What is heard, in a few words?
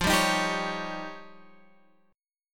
F 7th Suspended 2nd Sharp 5th, Guitar, Open G